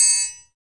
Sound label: PRC TRIAN triangle, Perc; Northstar - Drumscapes Roland; Bonus Perc; Triangles